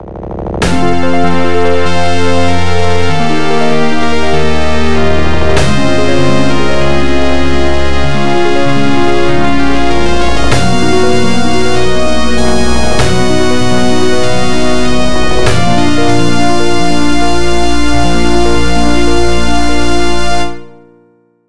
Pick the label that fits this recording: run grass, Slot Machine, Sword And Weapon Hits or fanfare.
fanfare